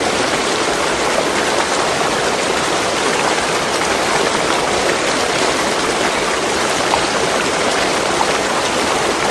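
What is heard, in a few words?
waterfall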